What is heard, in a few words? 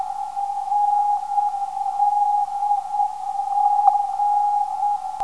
NDG 375kHz